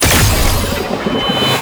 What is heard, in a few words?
Revive Complete; Warframe; Tau; Cinematics